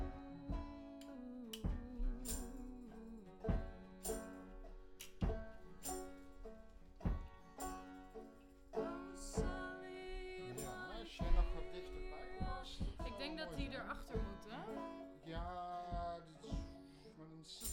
Voc Fig8

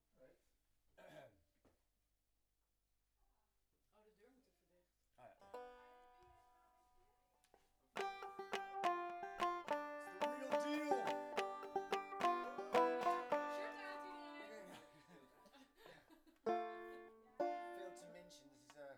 Banjo

